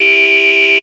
car fx; Zero G - Man Machine

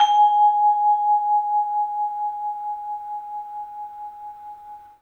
bell; 3D Sound; 3D Keys VOL-1

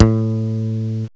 ELE BASS; M8; CMI Factory

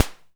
SD, Electro-Harmonix DRM-16

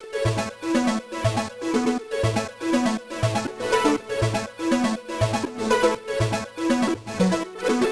121 F# FX; MELO + FILTER; RoBKTA Sample Pack Supreme

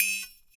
CYMBL; ILIO - Ethno Techno

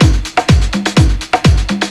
DRM 125, House

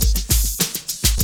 Loop; Sound & Vision - Gigapack I

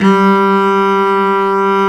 cello, Arco Solo; String Master Series

